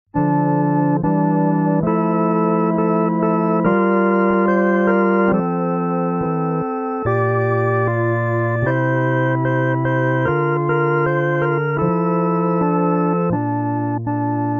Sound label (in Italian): solo musica